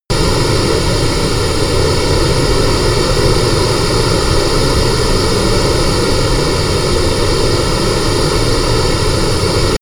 plane honda private small turbine full power close